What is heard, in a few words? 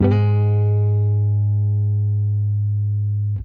JAZ OCT SLUR, guitar; Quantum Leap - Guitar & Bass